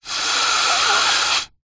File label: human snort nose through straw